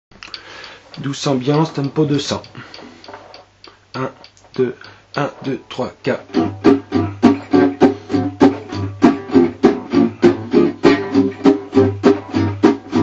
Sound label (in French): douce ambiance